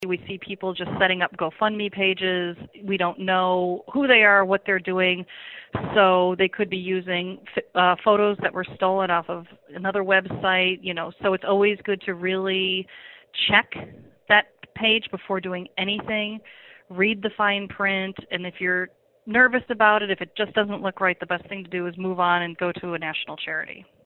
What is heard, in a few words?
crowd; MELB